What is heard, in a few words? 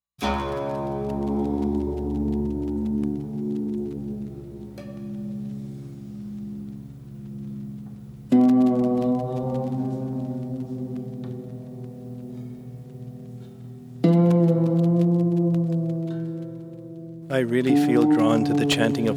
Drawn To Chanting